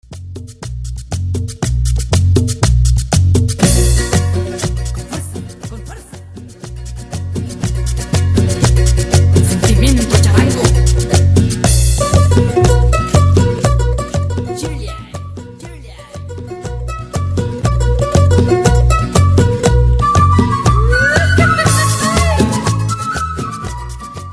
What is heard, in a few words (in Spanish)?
Intro, 120 Bpm